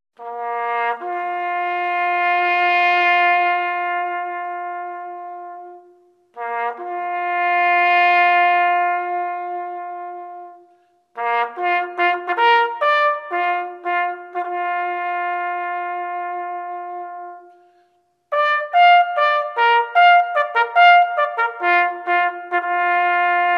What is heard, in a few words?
the last post jouee au clairon